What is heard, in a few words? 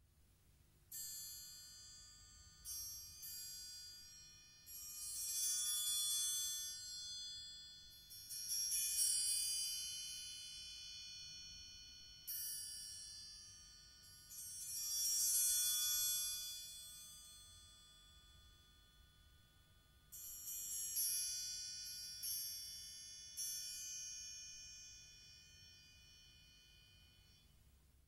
Triangle